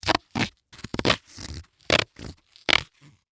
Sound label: Industry Tool Blade Saw Cut Thru Cardboard